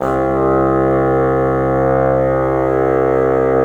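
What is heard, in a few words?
wind bassoon; LA Composer vol. 3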